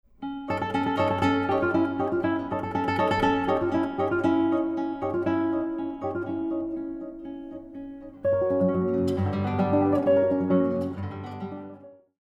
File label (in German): Gitarren Duo